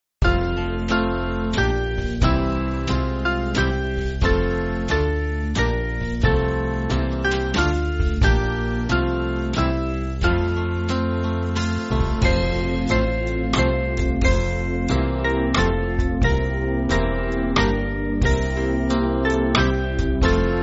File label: Band